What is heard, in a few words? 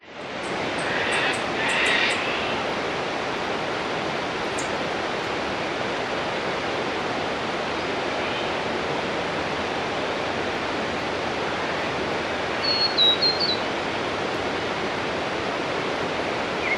nature rainforest waterfall eve winter birds cockatoos